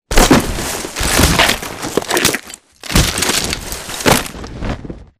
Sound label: flyer picking up soldier close; boneyard; intro; Titanfall 2